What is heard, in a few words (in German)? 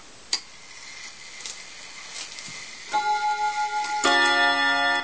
Bim Bam Schlag 30 Minuten Beispiel